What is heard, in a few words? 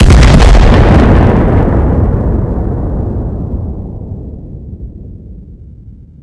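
Explosion Close; Mars Sortie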